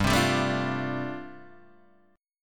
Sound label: G 7th Suspended 2nd Suspended 4th, G, Guitar, Open D; down